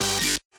wobble

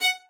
violin

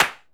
SE GAME ANSWER; maimai

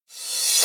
Dr reverse

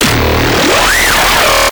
fx bass only

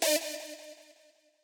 stab

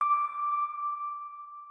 organ

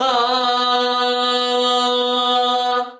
Voice B5

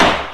Hit Start

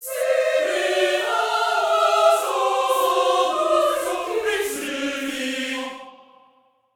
choir

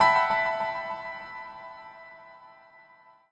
piano fil

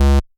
bass

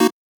Chord

